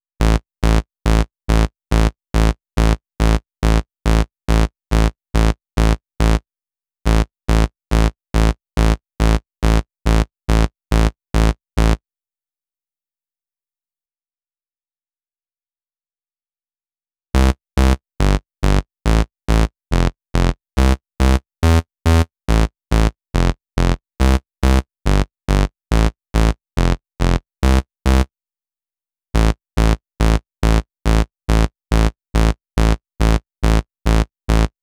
FX Vocal Bass, 140 BPM; Vengeance Samples; VTDS2 Song Kits; FX Vocal Candy Guy

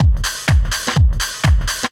drum loop 125, heavyhouse; PS-28 Vocal House Samples; drumloops

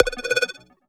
Synth Shot; Vengeance Samples

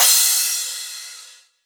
Cymbals Crash; Vengeance Samples

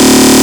Fill 140 BPM

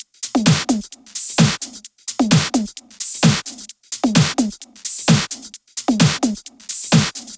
130BPM Kit; VFH1 130BPM Tibet Kit

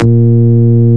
Root A#, Ultimate Bass; Vengeance Samples

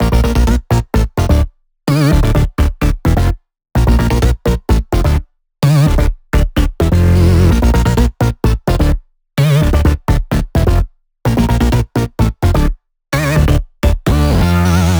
128BPM Dubwise Melody; VFH1 128BPM Dubwise Kit

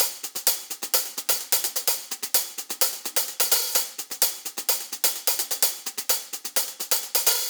Real Hihats, 128BPM; Loops; Vengeance Samples

